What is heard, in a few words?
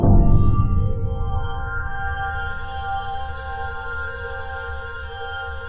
ICICLES L; NorthStar - The Wizard VOL-2; DRY ICE